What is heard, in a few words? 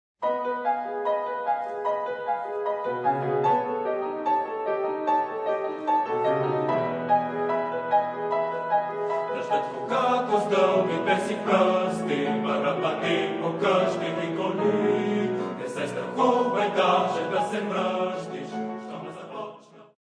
Rain, Boys' Choir; Sounds Colours; Дъжд, Ф. Павлов; Sofia Boys' Choir